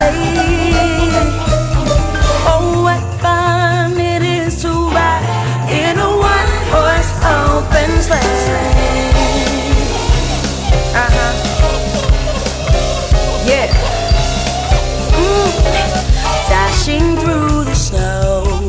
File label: jingle bells